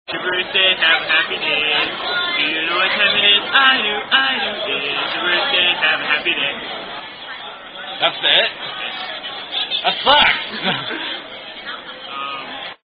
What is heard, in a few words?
Happy Happy Birthday Song; Tune Alloutte